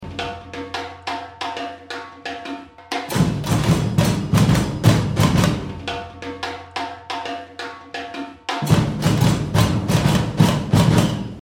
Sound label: groove break